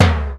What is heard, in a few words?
high tom drum one shot d key, Royality free tom drum one shot tuned to the D note.